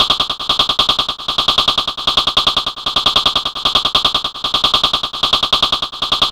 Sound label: HH clos fx, big beat; Big Beat Samples & More; AKAI; HHCLOS2 KIT